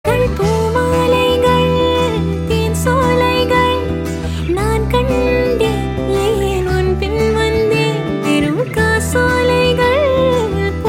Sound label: Female Ringtone